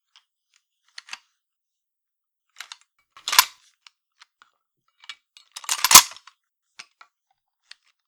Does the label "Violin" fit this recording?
No